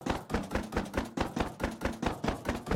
walk metal

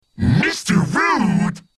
scary; mr nosey

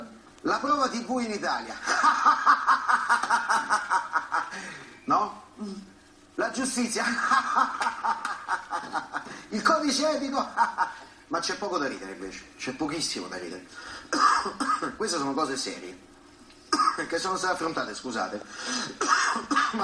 ahahahah

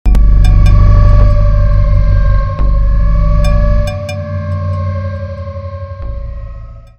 horror sound

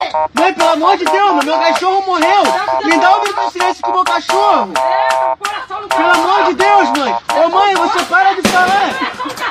ringtone

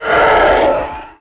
tie flyby; lego star wars

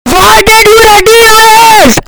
a lil scream

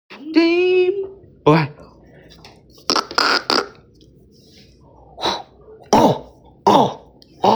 deep breath

